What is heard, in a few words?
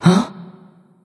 surprise; smite; voicepack; medusa